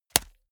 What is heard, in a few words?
small punch hard